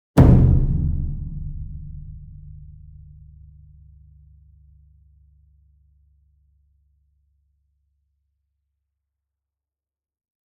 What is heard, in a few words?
ENSEMBLE DRUM Bass Drum Concert And Taiko Hit Medium; boom library; Cinematic Strikes Construction Kit